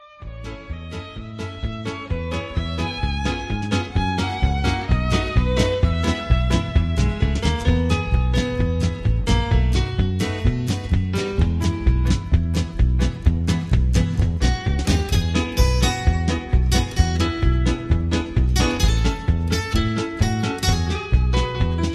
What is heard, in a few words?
Instrumental with Long Intro